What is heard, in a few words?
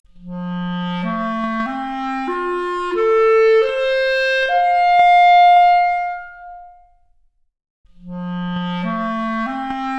Clarinet arp, Stereo output noised; 4bit